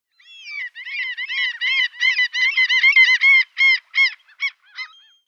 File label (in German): Sturmmoewe Larus canus, Vogelstimmen